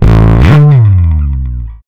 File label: FUZZ SL, Bass Legends, SLIDES; Spectrasonics; ALL SLIDES